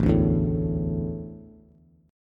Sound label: A Suspended 4th, Bass; Asus4 strummed; JGuitar